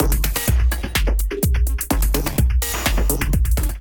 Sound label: Kit groove 126 bpm; MIDI Clips; 122 - 130 bpm; Night Hub Kit; Ableton; Factory Packs; Glitch and Wash